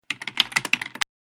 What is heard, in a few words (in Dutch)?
toetsenbord